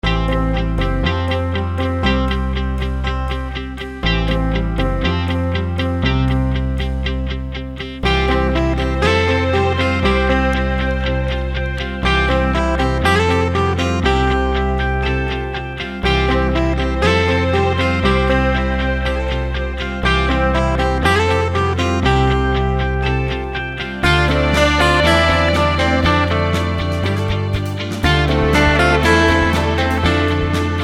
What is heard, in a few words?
Reef Riff, Aquarium; Gaia Online